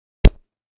Body Punch; punches kicks hits bones

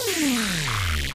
interface error, error tones; Multiple interface error tones at various pitches. Error, Interface Pitch, Tone Web Efx, Error

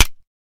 Mouse Trap Snap Low

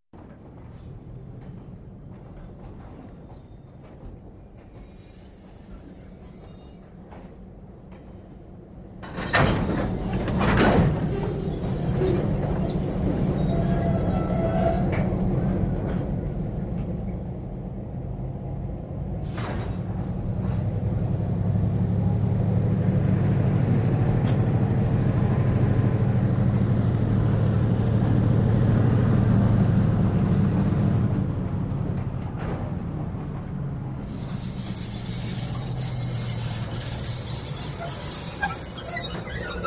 Train Coupling, steam

